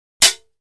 Swords Metal Hits, clangs

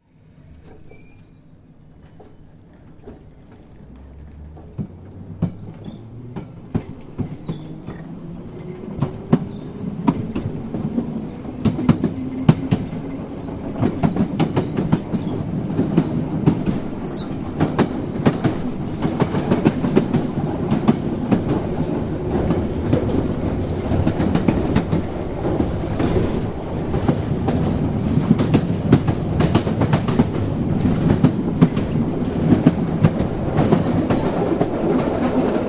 Train Int Rail Track, train

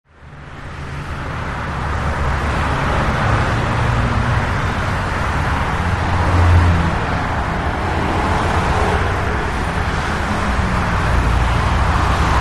Very Heavy Freeway Tr, highway

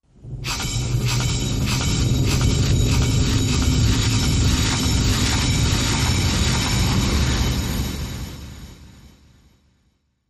Torque Averter Machine; machines winches

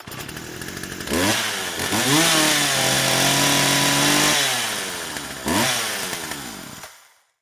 CHAINSAW; chainsaws

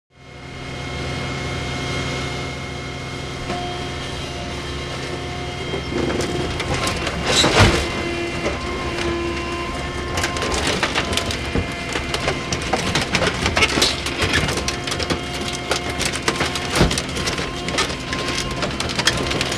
Car Being Crushed

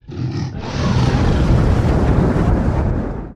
Dragon Roars Breaths

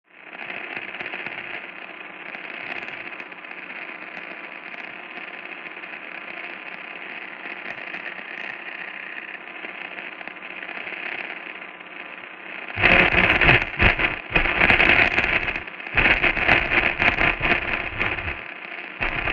Radio Distortion, static

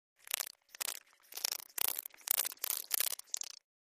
Insect Mvmt Vocal, beetles

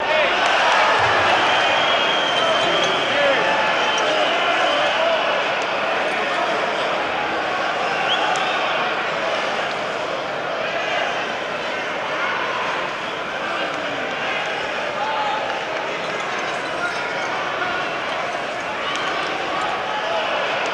Crowd Light Reaction, boxing

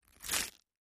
FLESH TEAR, gore

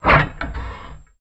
Lever Paper Slicer, papercutter

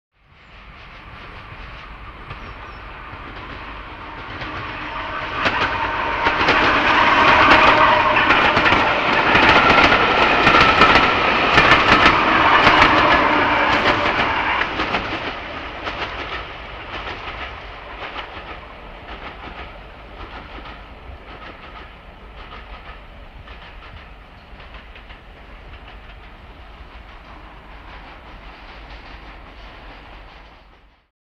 Electric Train Medium By

